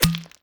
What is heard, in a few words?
Vent Ground Enter; Among Us